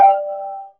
effect complete; Embalming Girl

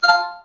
chat message; Embalming Girl

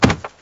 player exit; Embalming Girl

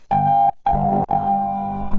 settle harmony fail; Embalming Girl

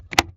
mic toggle; Embalming Girl

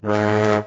action challenge; Embalming Girl

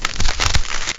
card deal; Embalming Girl

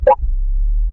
card hover; Embalming Girl

